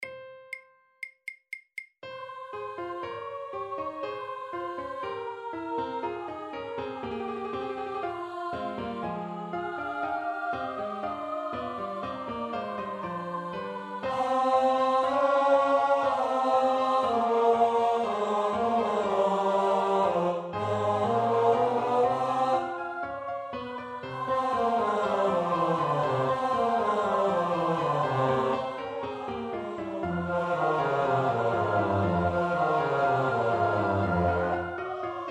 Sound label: Amen Bass; Full speed; 13. Amen (Bass, Full speed)